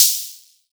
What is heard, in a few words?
hat, hithat